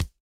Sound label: impact punch